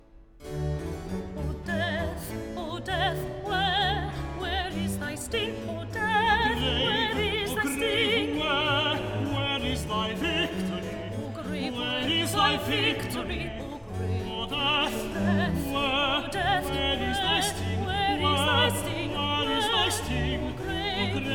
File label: duet o death where is thy sting